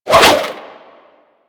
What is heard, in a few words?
Sword And Whip Spin Whoosh; Warframe